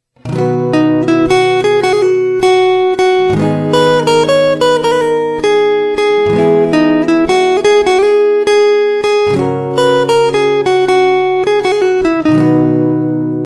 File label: bass guitar ringtone; mind refresh tone; guitar beat